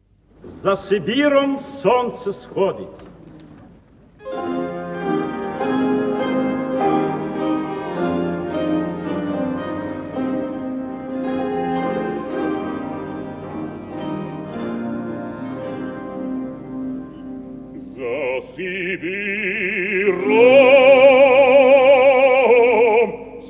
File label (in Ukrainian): капела бандуристiв Ukrainian Folk Song; Борис Гмиря; УPСР